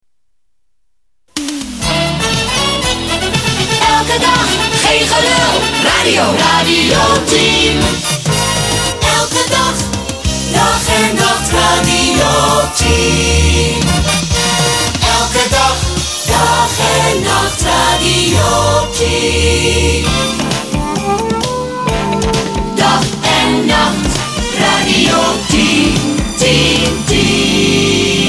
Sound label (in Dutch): Radio 10 Jingle Pakket